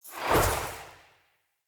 Staff Caster Cast Short; Weapons; Player; Staves; Starter

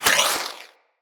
Wall Hand Slide; Footsteps; Wall Run; Characters; Tenno

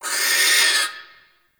Owl Critters Vocal Alerted; Ambience; Warframe; Duviri

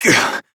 Pain, Adult Operator; New War

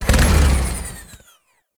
Boost Stop, Motorcycle; Warframe; Vehicles; Motorcycle Ninety Nine

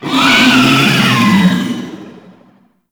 Use Ability; Enemies; Warframe; Tau; Sentient Dactolyst

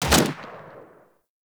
Fire Shot; Warframe; Weapons; Braton MK One Redux

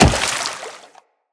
Wall Jump Water, Footsteps; Wall Run; Characters; Tenno

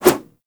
Generic Flip Whoosh, Generic Foley; Warframe; Characters

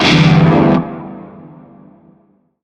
Shawzin Maj Chord E; Music; Warframe; Narmer

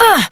Generic Short Pain Operator Voice; Operator Mission

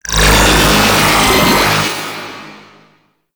Ground Laser Beam; Enemies; Weapons; Warframe; Tau; Sentient Dactolyst

